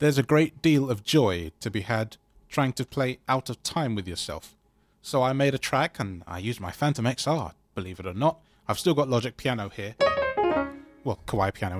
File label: Practice, Octaves and Madness